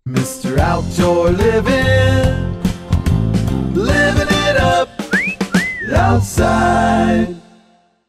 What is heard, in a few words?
jingle